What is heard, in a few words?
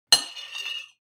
eat cutlery on plate cut squeak short; foley; smartsoundfx